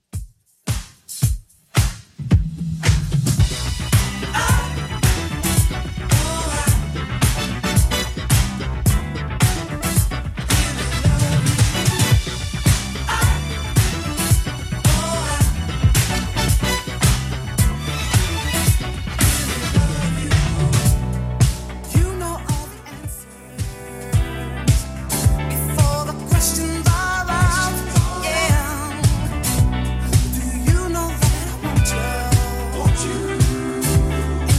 80s Redrum 110 bpm

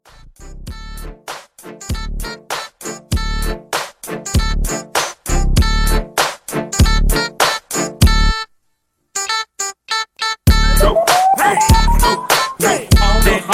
Hype 98

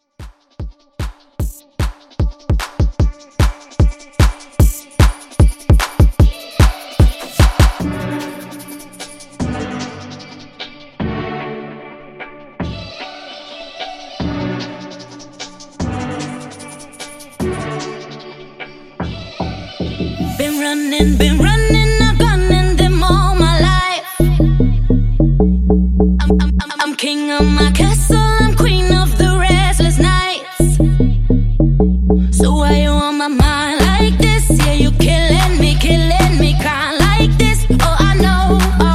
Dubstep Trap; Remixfreakz

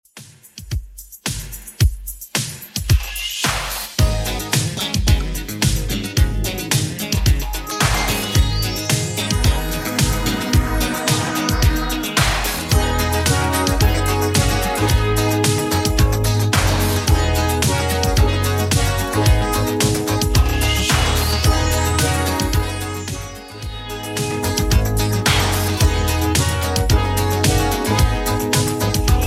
90s Redrum 110bpm

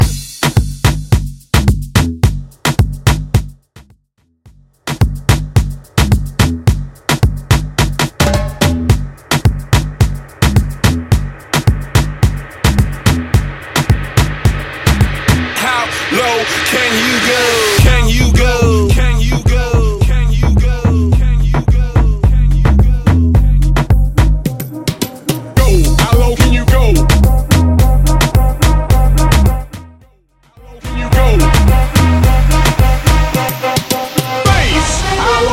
Dancehall Instrumental Bootleg; Mainstream; 108 Bpm

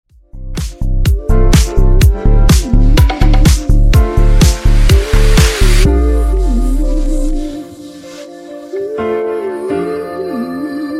Funk Clean 125 Bpm

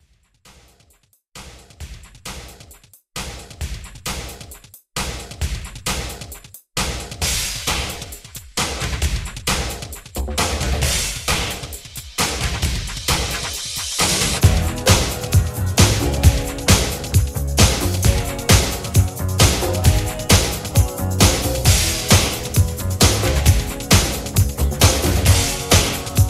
80s Pop Redrum 133 BPM

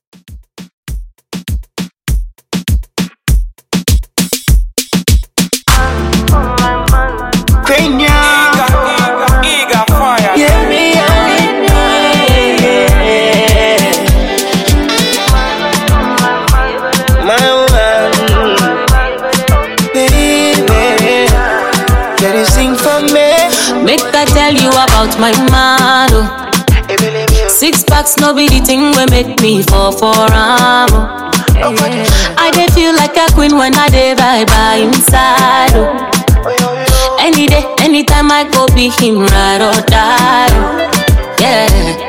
afropop moombah 100 bpm

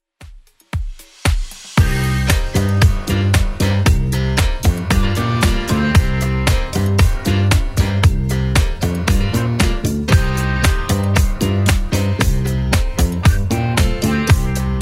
70s Dance Redrum 115bpm

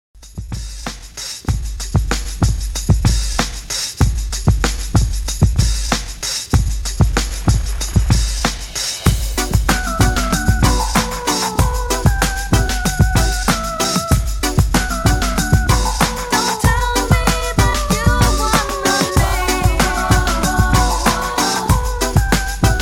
Classic Mix 95Bpm

